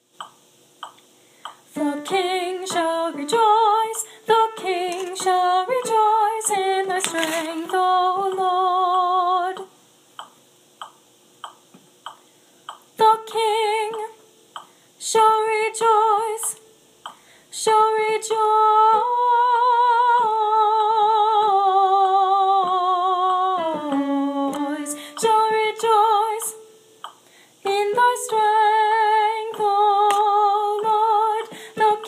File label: alto